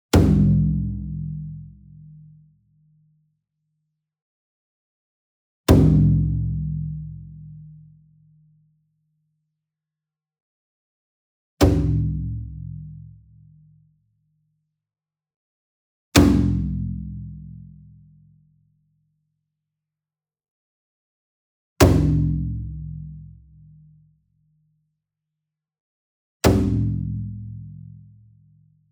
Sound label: SINGLE DRUM Taiko 28 Inch Hit Whip Beater Near; boom library; Cinematic Strikes Construction Kit